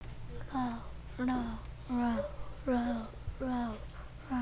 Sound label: laugh